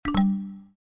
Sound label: wrong Sound